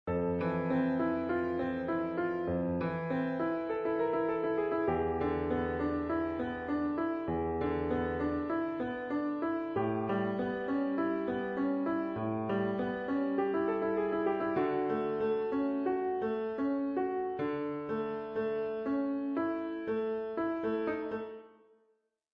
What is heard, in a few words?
Bossa